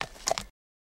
Horse Hoof Step On Gra

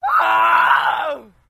Death Screams 2; 10 Male Screams, Various

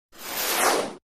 Synth Comet Whoosh By

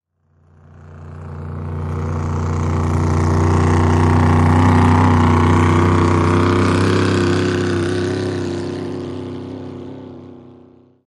Power Boat By Fast, off shore power boat

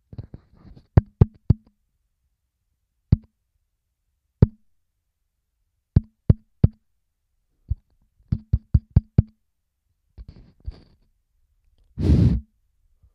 Handling Microphone, mike bumps

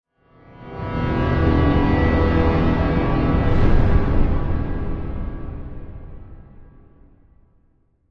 Music Eerie Low Bras, orchestral

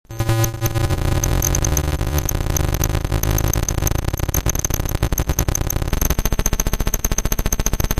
Data Communication, stinger

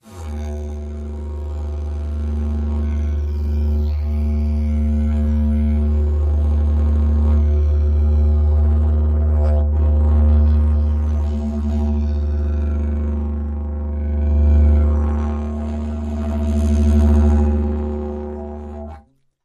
Didgeridoo Drone

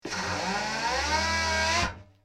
Drill Inter Barrel, servos

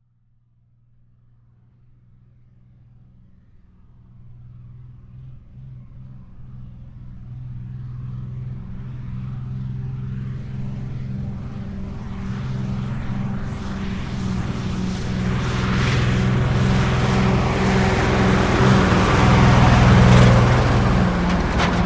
Truck 2.5 T Slow By; 2.5 ton

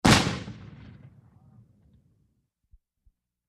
Army Rifle Shot Deep, exterior

